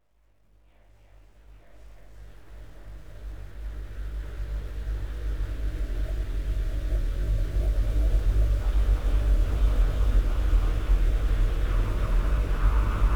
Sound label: noise research; concrete island